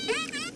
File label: orca